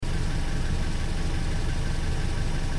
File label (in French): voiture; deadmaze